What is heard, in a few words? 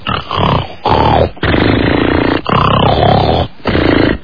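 snoring male muffled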